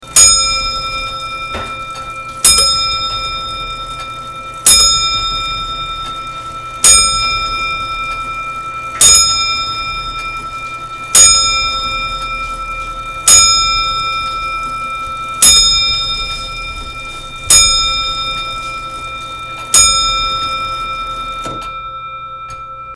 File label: chime